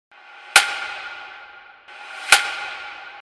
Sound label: Snare